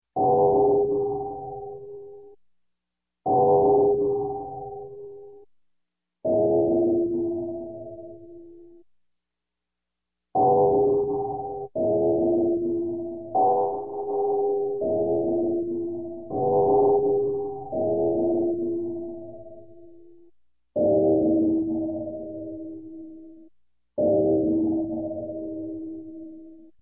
Space Mystery Space Chimes, Electronic; Sound Ideas 2000 Series

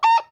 hit chickens bukuk; Blind Drive

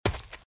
Footsteps - Leaves - Run - Right; RadwinIsland

